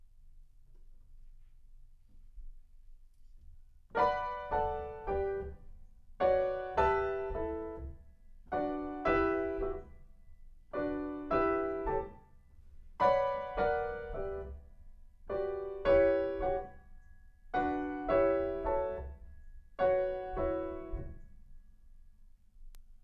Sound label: Piano Room